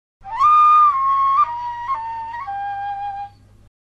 Nahawand tetra chord, a “Nahawand” tetra chord on Sol (G); second tetra chord; descending